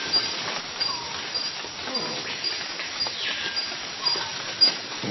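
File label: Anomaloglossus surinamensis, frog; guyane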